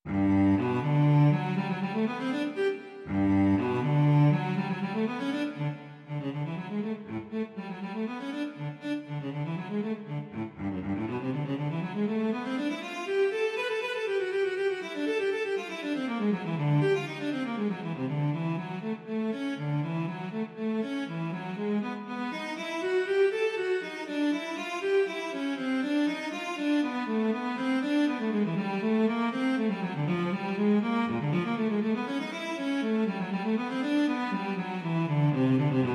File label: intermezzi animato